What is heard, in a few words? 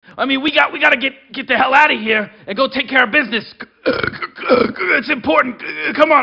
ding; Rick And Morty